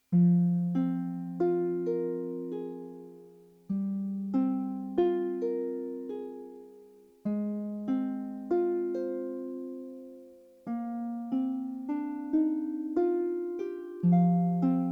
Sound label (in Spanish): arpa; tiene bastante ruido de fondo